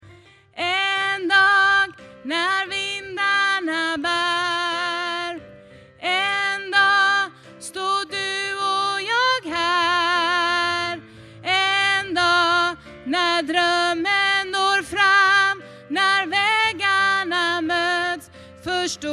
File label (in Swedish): alt, stammor